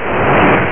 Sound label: FIREBALL; Theldrow